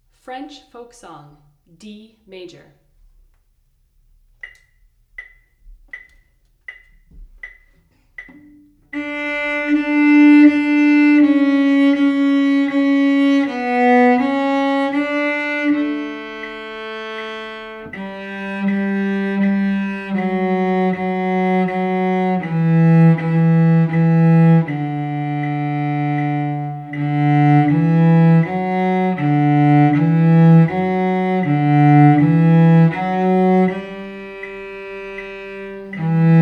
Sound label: French Folk D, cello; French Folk Song in D; 1st position